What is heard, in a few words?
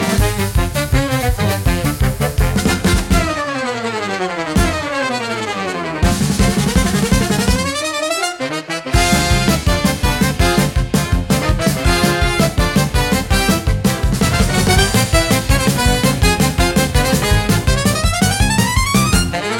Brass Parade Panic, upbeat carnival; for festivals and parades